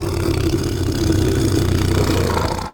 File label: growl